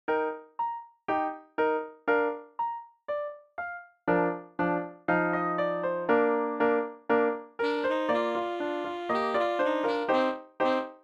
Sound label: Tenor Sax, Solos; Grade 9 Solos